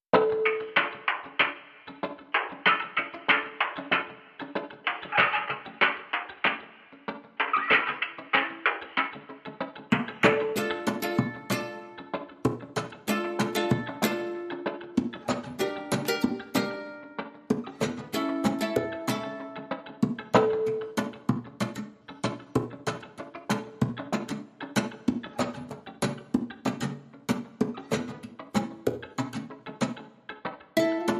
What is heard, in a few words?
Ukulele Guitar Mandola